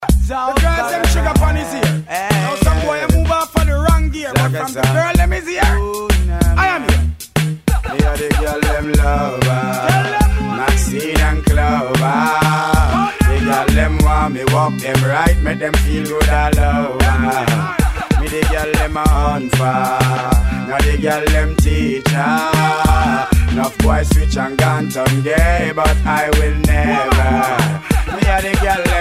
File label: dancehall